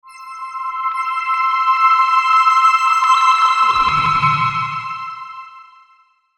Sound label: SHIMMER, Shimmer Resonance Warp; Warble